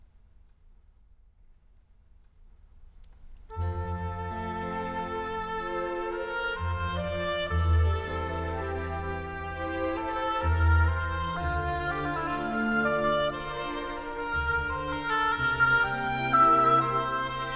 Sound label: Oboe Concerto in D Minor; J.S. Bach